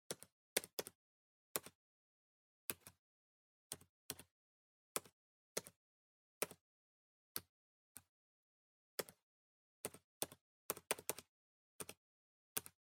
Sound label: PC Keyboard Arrow